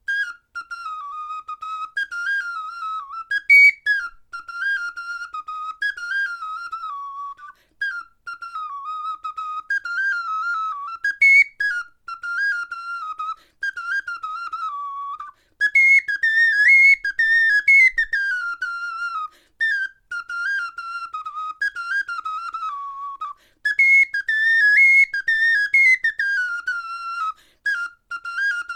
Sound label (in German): melodie